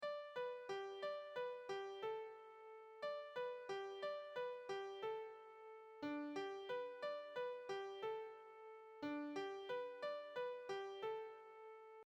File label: Fanfare Moderato